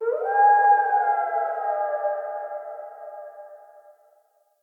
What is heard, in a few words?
Quest Wolf Cub Howl Stinger From Ship; Animals; Wolf Cubs; Howl Stingers; Orengal